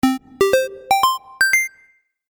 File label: Game App Little Victory, Video Game Sound Effects